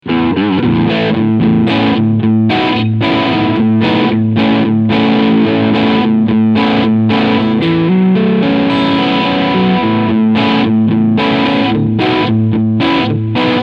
Epiphone Wilshire P 90 Crunch Sound beide PUs

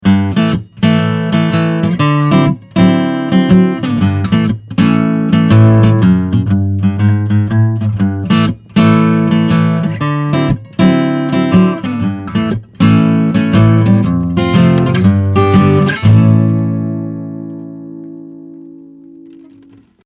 Blueridge BR-43 Plektrum

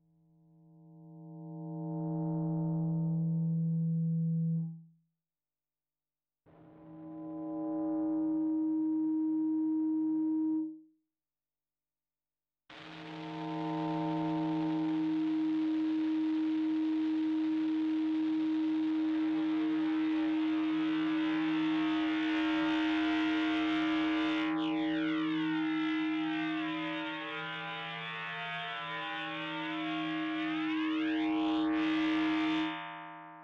Elements weisse Sektion Timbre

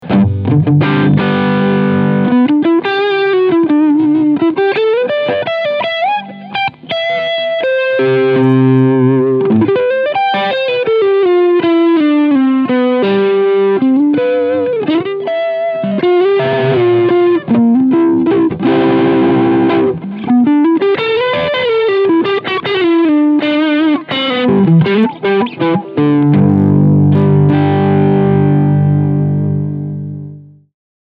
Strawberry Red DLX Gain 10 Mid 10 Bass 5 Treble 10 Presence 10 Uhr; One Control

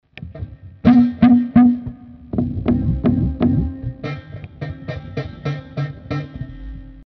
Framus Diablo Pro Vibratosystem Nebengeräusche

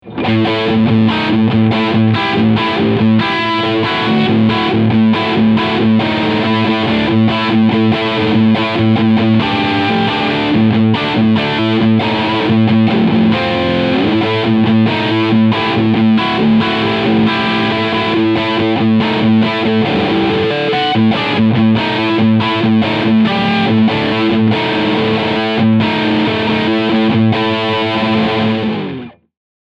Ibanez PWM20 Overdrive Riff Steg Pickup

